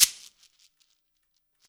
SHEKERE; InVision Interactive

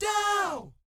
DOW G#4, Vocal Planet Jazz & FX; ILIO; JAZZCOMBO

